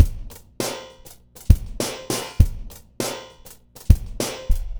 Remixed Drums 100BPM; The Roots Drumloops

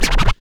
FX scratch; Club-50 - Foundations Roland; Scratch 1 S

